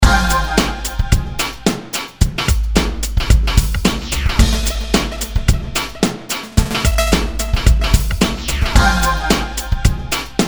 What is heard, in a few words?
acid dj expander